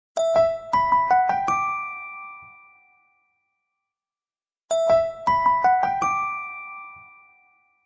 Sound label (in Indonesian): nada notifikasi Blackberry; Ringtone Blackberry original